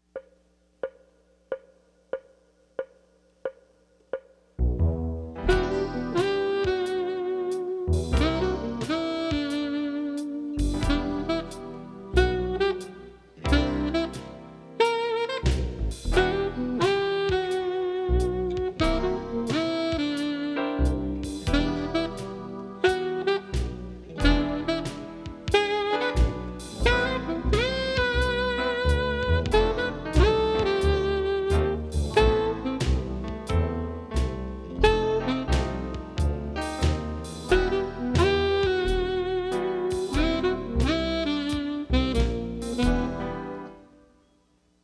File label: Funky Blues